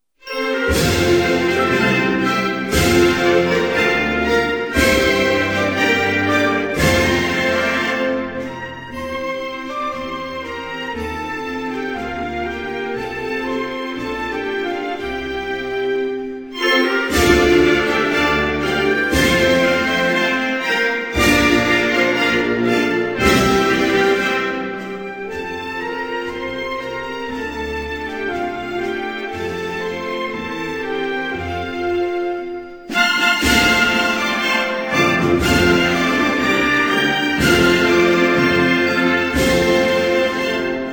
Laos, Hymnes nationaux

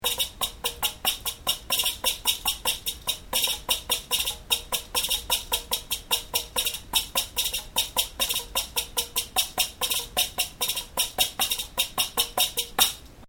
tambour maracas

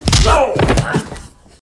Coup de poing

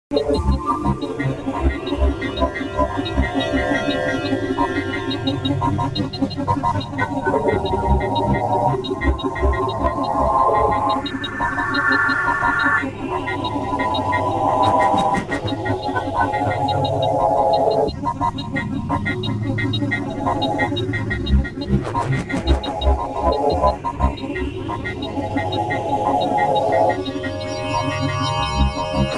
Robots Jingle

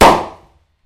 Exploser sac en papier; Explosions

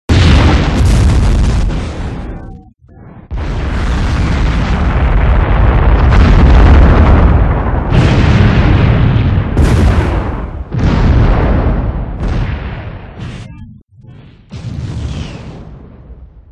Bombardement, Explosions

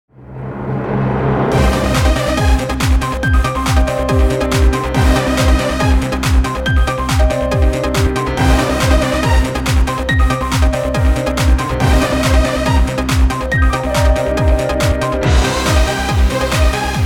Emission jingle

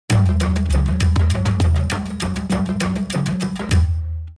Afrique tribale Liaison

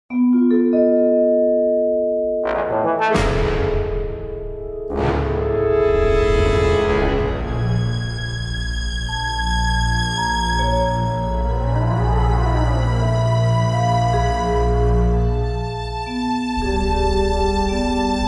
Jingle

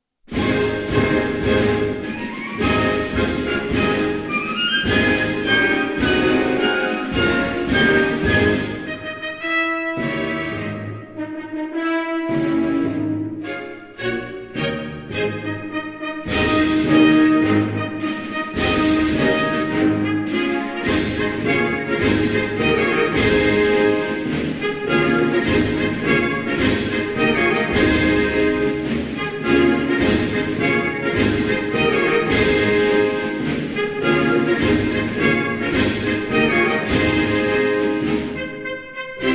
lybie, Hymnes nationaux

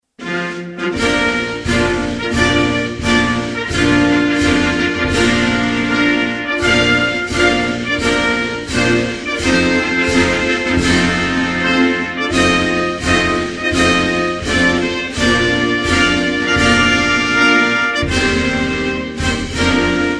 Papouasie Nouvelle Guinee, Hymnes nationaux

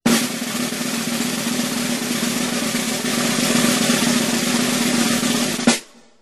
Roulement tambour; Cirque

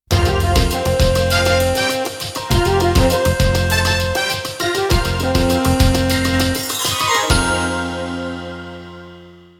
Emission link, jingle; Jingles medley

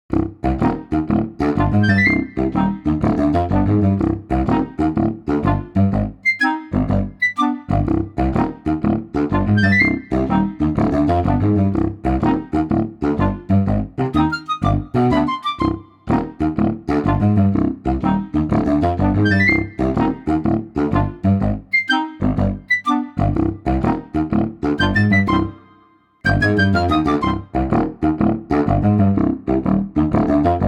Minimaliste

